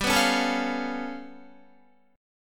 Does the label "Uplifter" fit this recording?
No